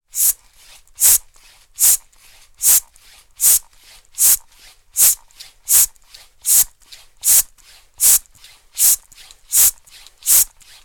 transport bicycle hand pump operating slow speed valve end